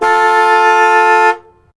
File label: car horn